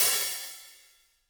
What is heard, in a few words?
room Op Hat, drum